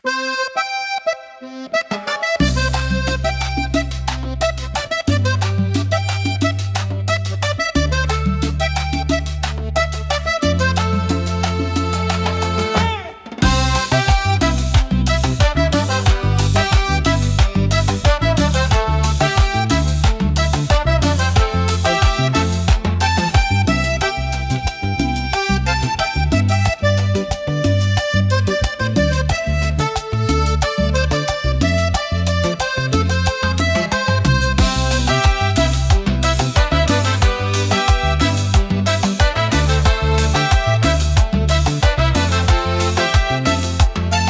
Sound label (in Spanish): instrumental vallenato